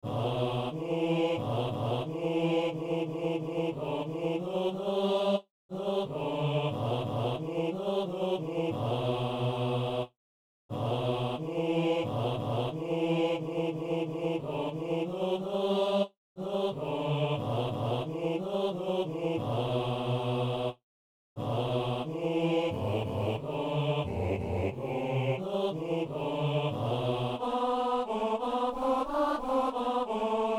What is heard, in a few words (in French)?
hommes; Saint-Denis